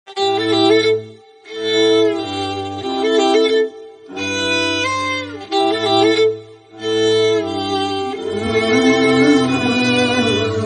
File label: tamil love ringtone; Bachelor